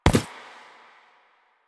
Butcher Step Default, Footsteps; Enemies; Soldiers; Foley; Warframe; Lasrian

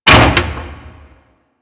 Mover Metal Clunk; Ambience; Props; Warframe; Orokin Derelict

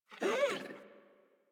Puppy Interact Affection Open Mouth Short; Enemies; Kubrow; Warframe

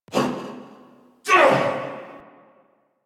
Grunt; Nightwave; Season Three Glassmaker; Nihil Fight

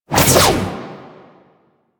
Backflip Whoosh; Enemies; Foley; Generic Foley; Warframe; Duviri; Dax

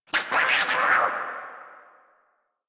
Attack Effort; Grineer Jester

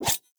Dagger Whoosh Heavy; Sinecure Dagger

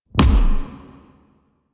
Quick Draw Punch; Warframe; The New War; Quests; Weapons; Omicrus

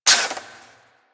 Harpoon Gun Mech Stop; Warframe; Sharkwing; Grineer

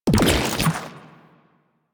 Dispensary Burst; Warframe; Protea

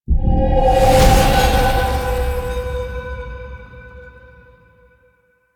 Transition In; Duviri; Zariman

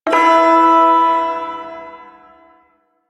Ersatz Shawzin Chromatic Chord; Music; Chords

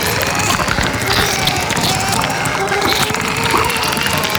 Exterminate Pod Grow Loop, Infested; Warframe; Infested Eris; Encounters

